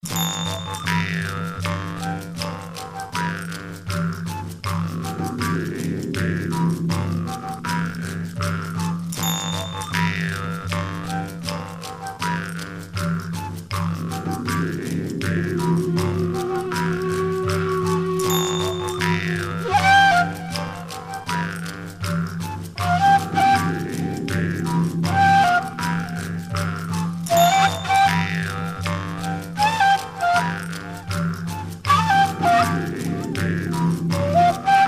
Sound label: tribal thunder